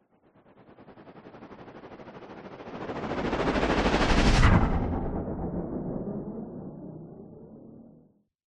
sound design sweep stutter white noise into loud fast whoosh flanged tail; zapsplat